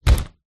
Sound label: household cupboard door cheap thin close